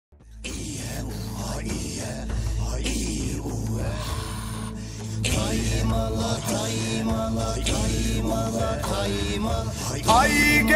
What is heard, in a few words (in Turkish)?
Folklorik Öğelerle Türkmen Türkçesi Bir Müzik Videosu; trt avaz